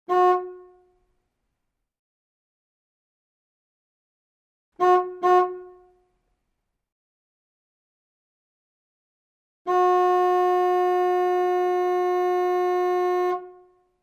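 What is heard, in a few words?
Car horn sound, klaxon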